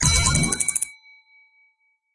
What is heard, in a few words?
time bonus